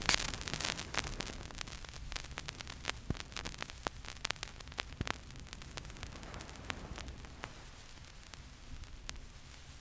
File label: fuzz and pops